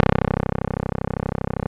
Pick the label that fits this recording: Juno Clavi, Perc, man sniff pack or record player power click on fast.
Juno Clavi